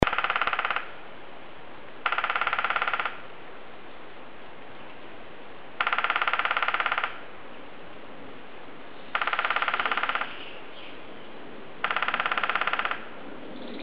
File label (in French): pic epeichette tambour